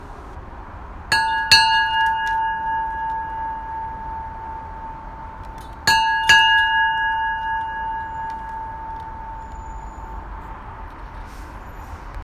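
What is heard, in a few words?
Coast Guard Bell; 1942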